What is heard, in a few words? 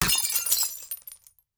glass break